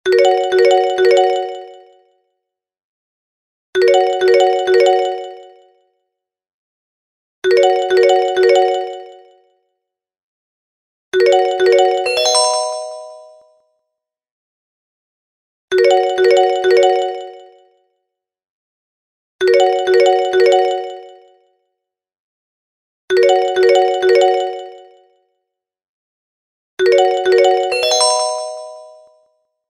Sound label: Simple Business Tone